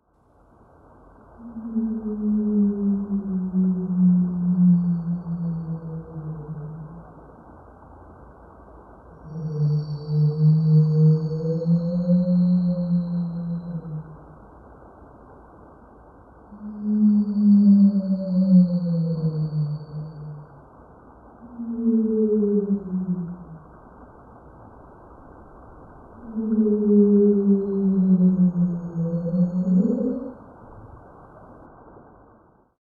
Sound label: Whale call underwater perspective